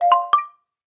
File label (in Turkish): Realme Bildirim Sesi